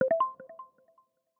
health notification; iOS Sounds

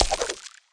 app delete; ESAN VO Sound Schemes; ESAN VO Sounds Pack; Esan isounds add-on

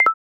Quick Nav Arrow Key Off

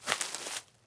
brush step; Golden Crayon Sounds

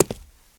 concrete step; Golden Crayon Sounds

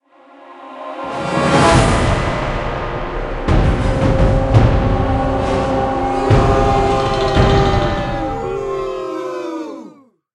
you lose; RS Games Sounds; Monopoly

